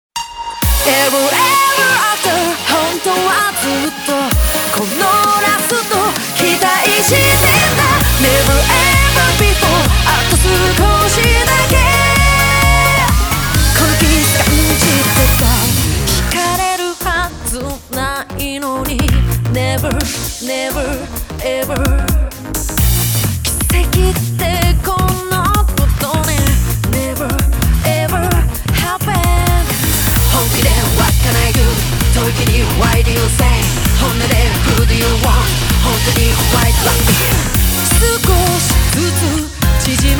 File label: music